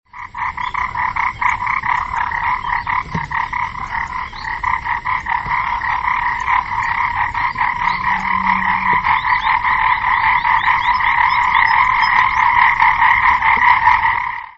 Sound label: sakishimanumagaeru call, frog